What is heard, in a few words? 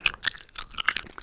Zombie Eating Chewing